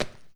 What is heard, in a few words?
Landing Footsteps